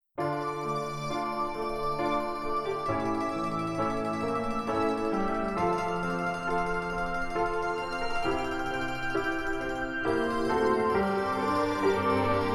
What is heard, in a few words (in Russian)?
Skripka Nerealno Krasivyy Trek